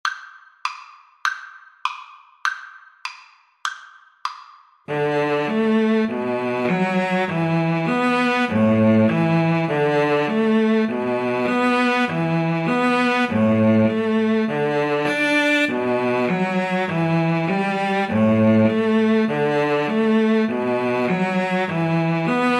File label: Viola Cello Duet